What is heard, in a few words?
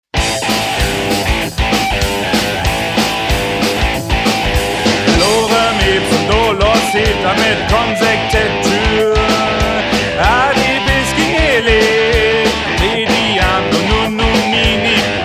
vox